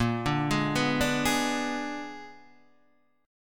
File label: Bb 7th Flat 9th Bb down Guitar Open D